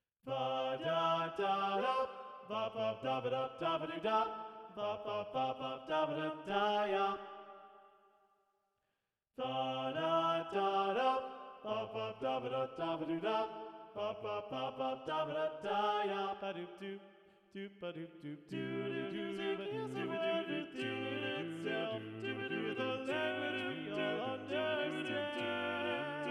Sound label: Duet